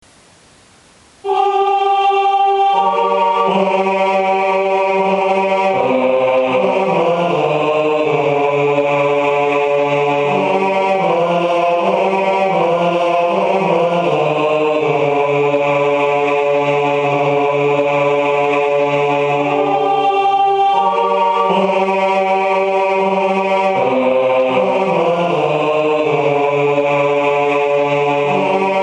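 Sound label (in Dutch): Haec est clara dies BAS, klankopname (digitaal); sintjanskoor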